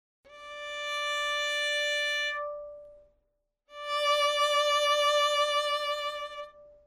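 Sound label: violin Vibrato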